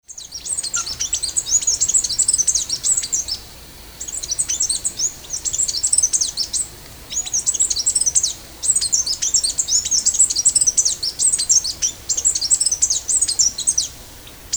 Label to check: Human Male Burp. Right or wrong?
Wrong